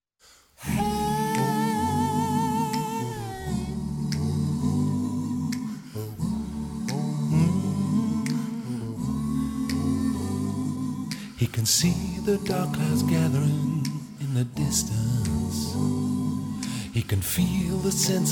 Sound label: Happy in hell, acapella